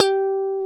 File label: GTR Funky Strat; 90's Sample CDs; Club-50 - Foundations Roland; Funk & Mute